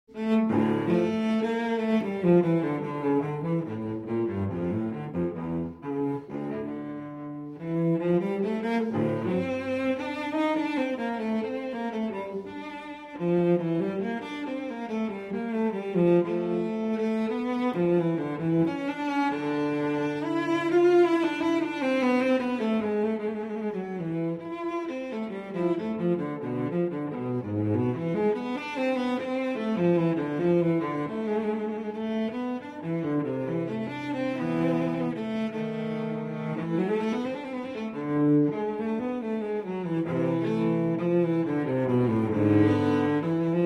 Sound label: Cello Suite in D Minor Allemande; Cello Suite No. 2 in D Minor, BWV 1008; II. Allemande